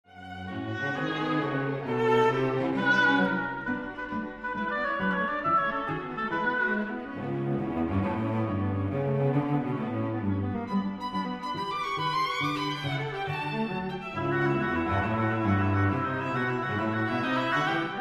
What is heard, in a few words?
Quartet